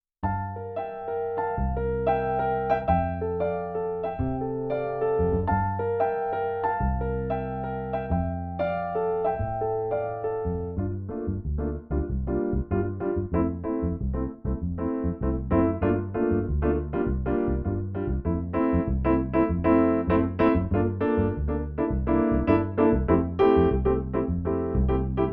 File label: nord piano 4 split piano acoustic bass